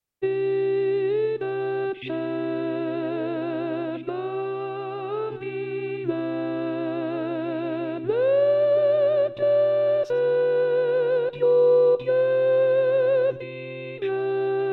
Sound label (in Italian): Soprani; Re Del Ciel; Gruber; natale